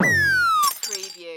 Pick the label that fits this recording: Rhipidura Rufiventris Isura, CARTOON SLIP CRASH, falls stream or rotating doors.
CARTOON SLIP CRASH